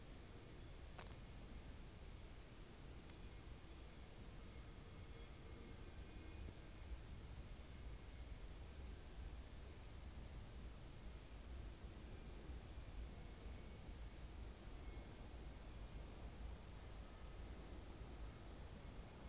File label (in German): letzte Nacht mit Wind